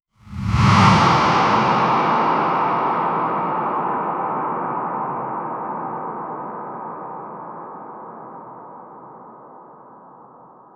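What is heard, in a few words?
rhythmic strikes or a boom; brainwashing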